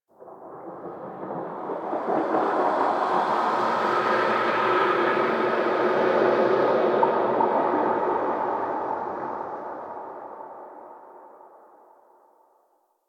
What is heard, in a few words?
Rail Jack Exterior Earth Space Wind; Ambience; Earth Region